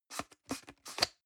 plastic can small lid screw open hard

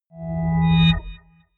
chord swell short

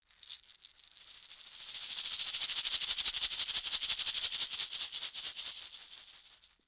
shaker wood rattle dynamic

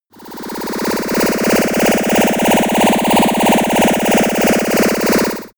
sci-fi approaching ufo

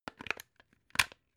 plug plug in clatter

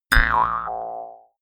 mouth harp

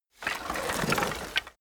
bones rustling movement

